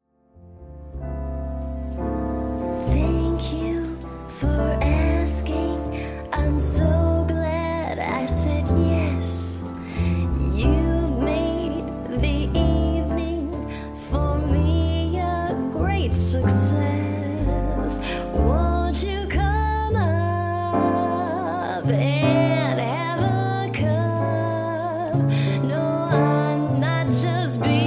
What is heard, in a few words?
vocal, jazz